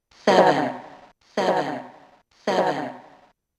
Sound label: test gus classic; Soundcard test page; Gravis Ultrasound Classic; Descent